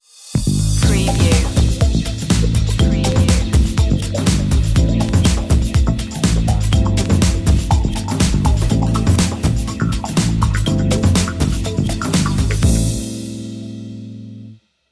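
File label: underscore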